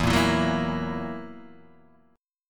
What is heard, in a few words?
E Suspended 2nd Flat 5th E down Guitar Half Step Down